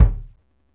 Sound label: Br Kick, Roland BK-5